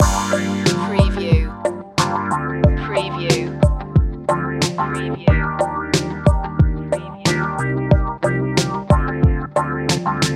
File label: mellow retro loop